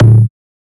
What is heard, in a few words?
Error Buzz; FREE SFX PACK VOL 2